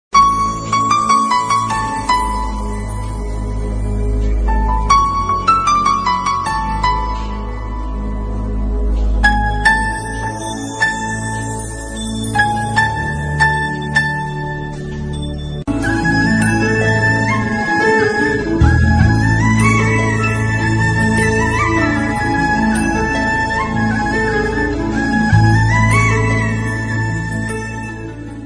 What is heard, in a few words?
song bgm background music